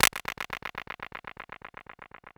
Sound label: DUB CLAP; E-MU Formula 4000 Series Vol. 3; Analog Odyssey; Electro Perc